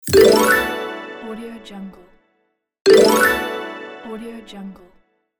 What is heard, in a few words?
xylophone achievement; gamechestaudio